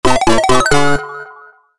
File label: UI Workbench